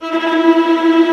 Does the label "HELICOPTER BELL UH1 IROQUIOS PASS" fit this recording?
No